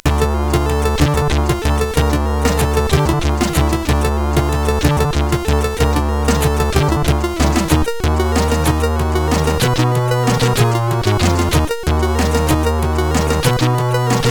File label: Waltz, CSG8580R5